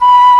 TRON FLUTE; E-MU Formula 4000 Series Vol. 5; Protozoa

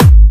Clubby Kicks, Bassdrums

